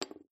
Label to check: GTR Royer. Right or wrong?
Wrong